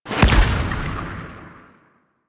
Combat Operator Void Melee Punch; Warframe; Focus; Powers

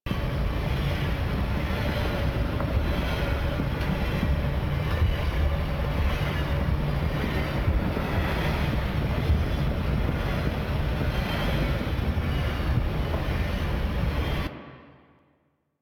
Train Rumble Loop; Warframe; Caliber Chicks minigame; environment

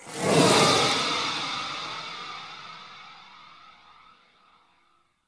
Purgatory Ghost Spawn; Warframe

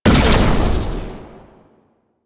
Shotgun Shot Fire; Weapons; Warframe; Pax Duviricus

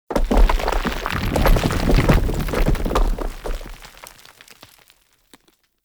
Under City Falling Rock Small; Ambience; Dungeons

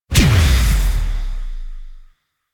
Wisp Harness Impact; Warframe

